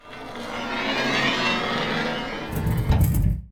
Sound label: double devastation, pirates